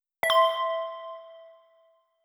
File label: notification mobile